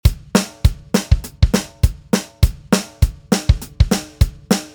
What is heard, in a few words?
beat motown